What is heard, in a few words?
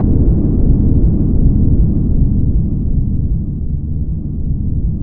pink bomb, synth bombs; Zero-G Ambient 1